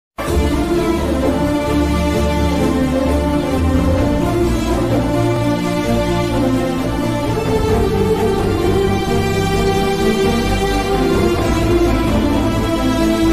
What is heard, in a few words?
emotional bgm